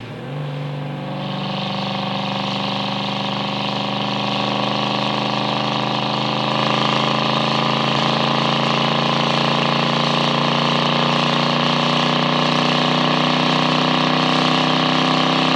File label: Mustang Medium Accelerate